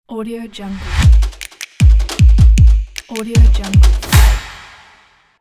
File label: sport logo reveal